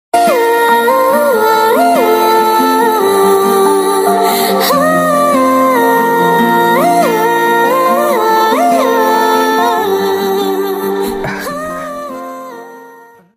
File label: ringtone female; supreme khiladi 2